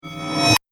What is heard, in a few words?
Horror Abrupt Riser; Scary Soundscape Sound Effects